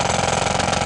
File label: DRILL; Effects; worms